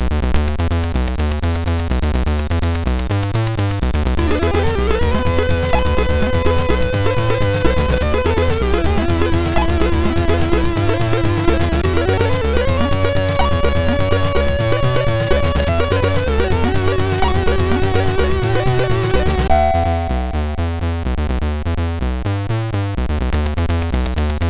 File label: intro, sid MOS6581R2